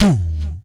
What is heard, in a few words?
VOC DRUM, MOUTH PERC; Best Service Hyper Dance